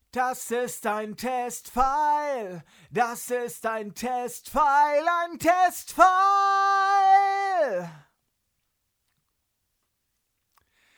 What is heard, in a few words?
vocals TLM192 Preamp